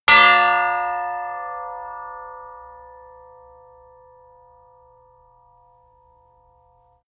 sword hit sweetener

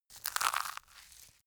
crunchy hard

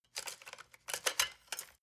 decoding machine handling rattle and clicking

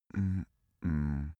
cartoon male pity shake head

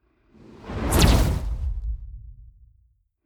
whoosh fire zap flutter

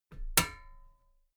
metal ladder step regular clank

